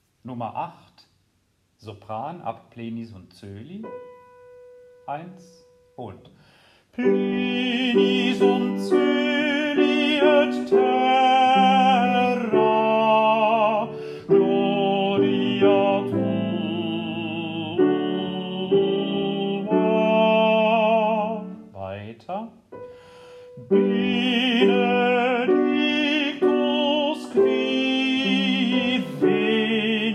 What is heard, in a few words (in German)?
Sopran; Dioezesan Caecilien Verband; DCV Chorfahrt; Übaufnahmen